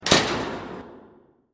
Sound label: breaker switch